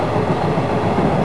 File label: Trailer